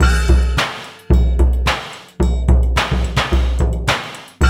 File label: Hip-Hop Gong